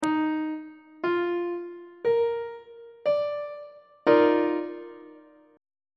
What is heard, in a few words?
e flat major 7th suspended 2nd chord; on treble clef